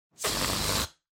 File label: Balloon Deflate